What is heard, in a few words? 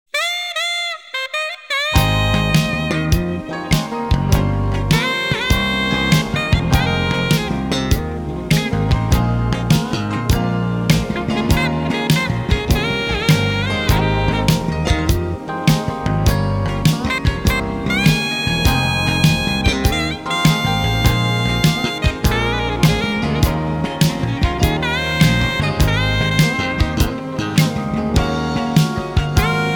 TV Instrumental Version